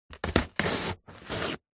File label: foley cardboard box cut stab and remove utility knife; zapsplat